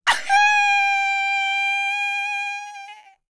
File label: YELP, World Voices, ANDES FX; Spectrasonics Vocal Planet CD5